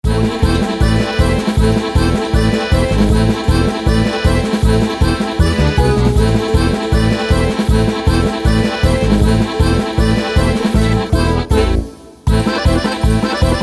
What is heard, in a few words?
russian dance; gga-music